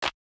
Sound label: Clap Snare